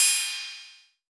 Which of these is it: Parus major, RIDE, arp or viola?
RIDE